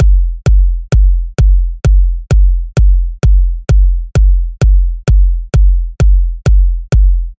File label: Clean Techno Kick